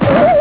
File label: bounce; waycoolgames; escape